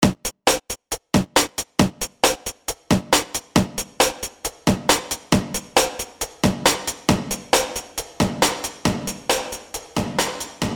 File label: loop moving through room